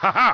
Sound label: haha